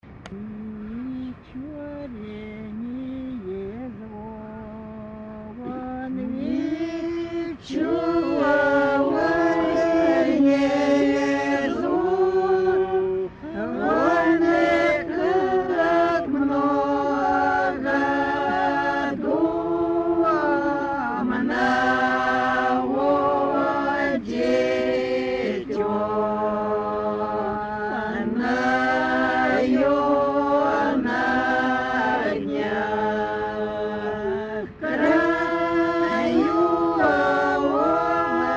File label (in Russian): Vecherniy zvon